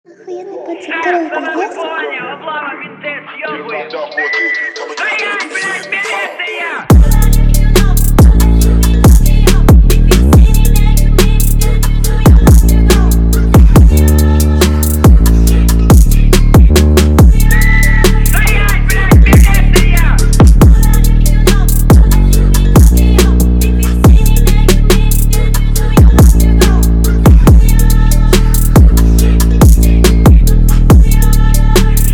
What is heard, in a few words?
bass boosted; интро